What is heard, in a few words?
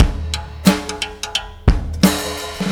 88 bpm, breaks; Zero-G Beneath the Planet of the Breaks